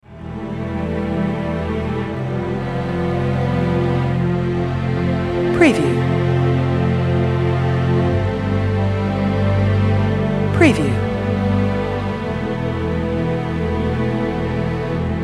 Dramatic Emotional Heroic Orchestral Strings Soundtrack Film Score